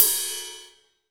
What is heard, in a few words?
RIDE, HR-16 Drum Mashines; EdgeSounds